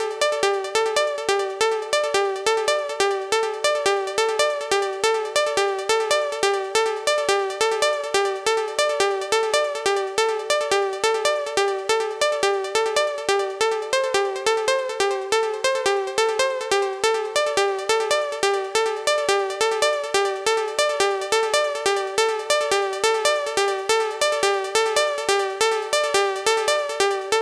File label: Pluck 140BPM, Dminor; Melody & Synth; Vengeance Samples